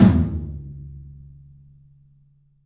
TOM, Low Toms, 3D Percussives

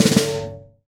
SF Snare Ghost, Roland BK-5; Snares

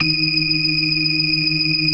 BLOOD OCT, Synth and Organ; InVision Interactive; Keith Emerson; The Most Dangerous Synth and Organ; P.BLOOD OCTV

